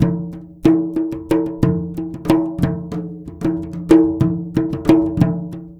MLY DRMS; Spectrasonics - Heart of Asia CD2

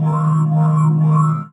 WORRY SYN; Synth

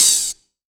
A2, synth line; 90 s Sample CDs; NiceBeats; SynthLine VOL-3

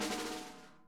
SNARE, Interactive Drum Kits

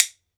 WOOD BONE, Celtic Percussion

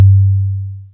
TOM L, TOMS; Back In Time Records; Big Bang

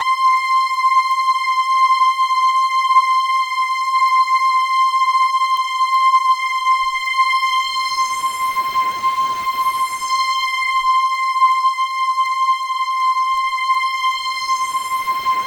C5 FM, Synth; 90 s Sample CDs; NiceBeats; SynthLine VOL-20

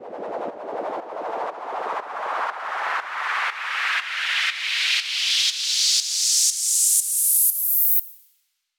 Uplifter; 99Sounds x Monosounds

